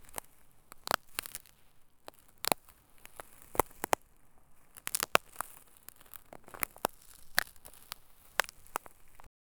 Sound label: short organic crack like